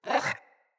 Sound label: Kubrow Puppy Interact Bark Speak; Vocals